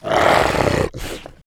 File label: wolf growl; The Elder Scrolls III - Morrowind; were